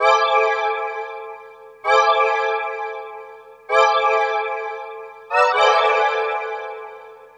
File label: NRG Pad